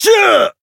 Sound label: Voice attack